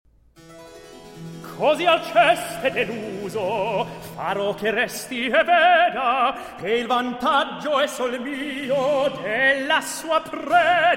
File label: Recitativo; Telamone